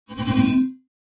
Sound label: Pop Up Elect Whip Phas, pop up element